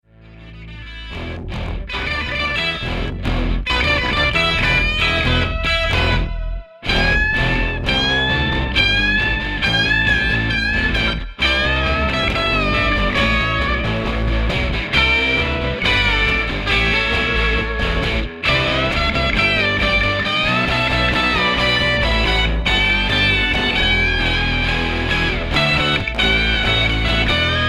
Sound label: Rhythm Bass